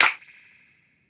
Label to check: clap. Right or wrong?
Right